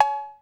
COWBELL, COMPU DRUMS; Crypton - DrumBase5000

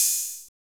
CYM 909, Roland

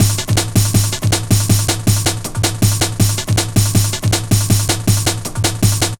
160, Drum Loops, Drum & Bass; Total Drum&Bass

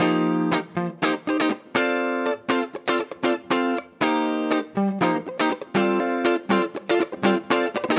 Guitar 120bpm Cm, Nu Disco; Zero-G; Construction Kits; Legacy

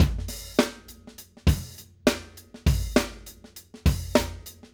Acoustic Live Drum; Platinum Audiolab

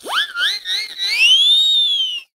Wizz Whistle, cartoon Foley Fx; musicradar; cartoon caper

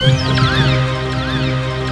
WET, WATER; AKAI S6000 CD-ROM - Volume 1

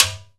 Timbale

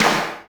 DANCE SET, DRUMS

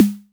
snare, techno 138 BPM; Best Service ProSamples vol.54; AKAI

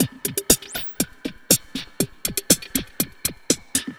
Tech Break, Beats; Synchro Funk; Plumpy; Sonic Foundry (Sony Creative Software)

